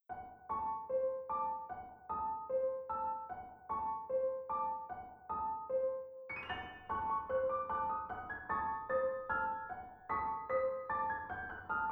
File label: Piano in F minor